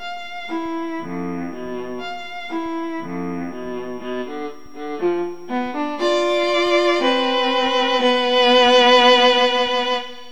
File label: battle win; RPG